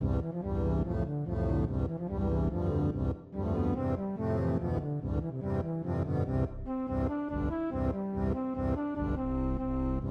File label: ensemble